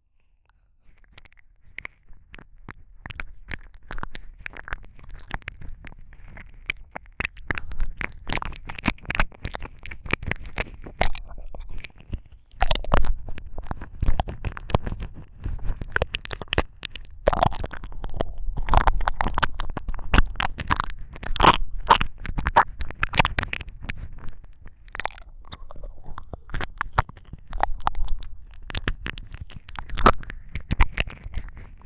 唾液多めの耳舐め, ASMR

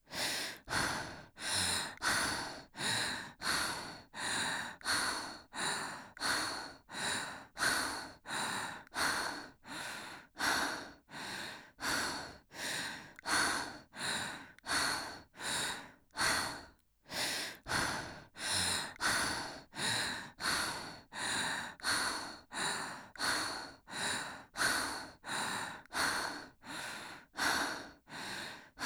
呼吸音_1-2（口呼吸）ロング

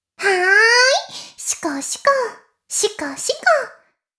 妹 しこしこ音声

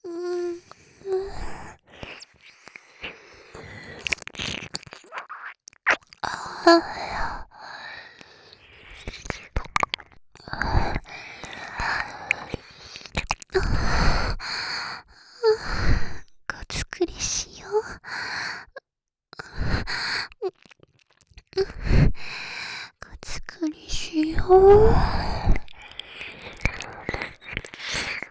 耳舐め 喘ぎ

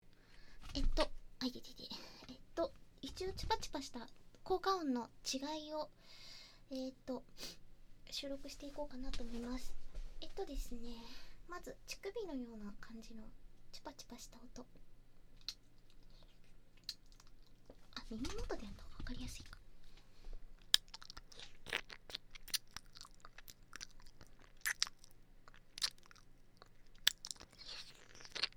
フェラ音; ヘッドホン必須！声優さんのひ・み・つ☆

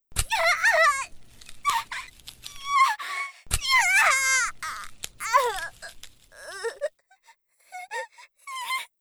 刺され; 効果音あり

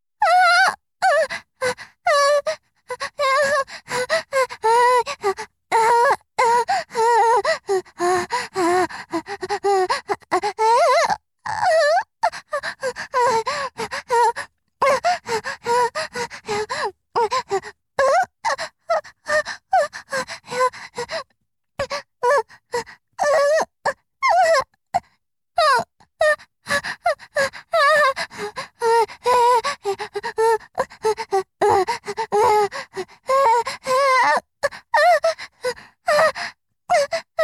自慰(強):激しく性器をいじる; 実用ボイス抜粋集

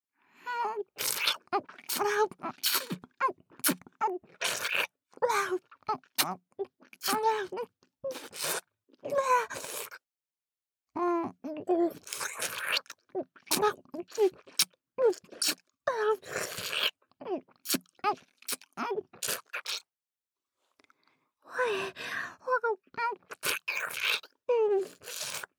ピストンフェラチオ【ループ】; ループトラック

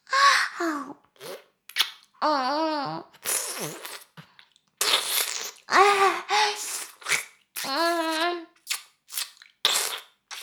耳舐め音声(LR逆ver); 少尉; 牧場戦腺

